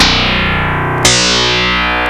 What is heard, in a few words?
PPG BASS; OLD SYNTHS; Rubber Chicken Software Sample Bank